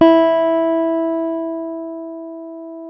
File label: E 4 JAZZ GTR; Univers Sons; Jazzistic